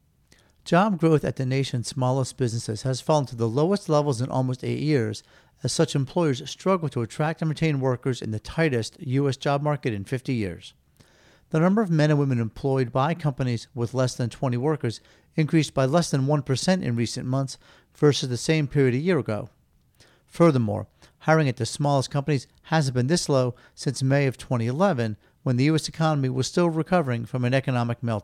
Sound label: Small Business Blues